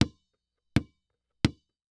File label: Sports Basketball Dribble On Hardwood Floor Slow